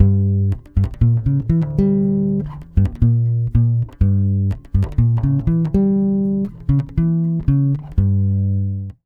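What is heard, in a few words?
120 bass G diminished, brazil bossa; USB Soundscan; AKAI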